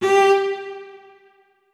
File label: notes, keyboard; Hideout Furniture